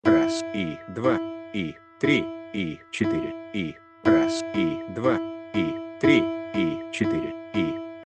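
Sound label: count of notes four quarters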